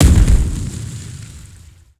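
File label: explosion turret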